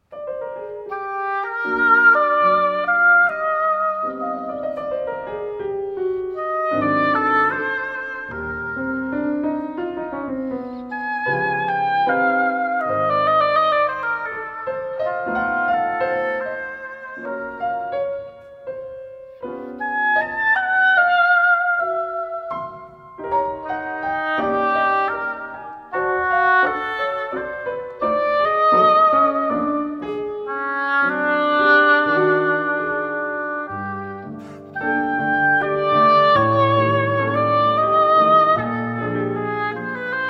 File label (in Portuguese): Sonata Para Oboé e Piano I. Moderado